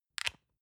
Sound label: lotion lid open medium; berufe; friseur